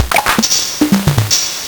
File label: Nintendo Gameboy LSDJ CR8000